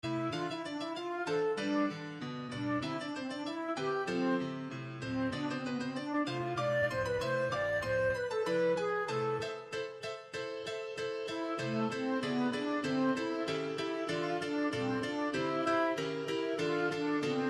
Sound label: instrumental